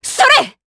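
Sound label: Vox Attack Japanese; Glenwys; kingsraid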